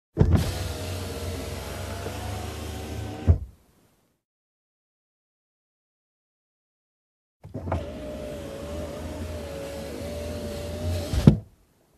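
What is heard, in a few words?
sound of lowering glass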